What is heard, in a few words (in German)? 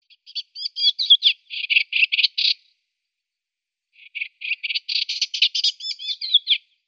Blassspoetter Hippolais pallida; Vogelstimmen